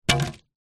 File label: foley wood pine plank short thin 40mm x 10mm set down on soft surface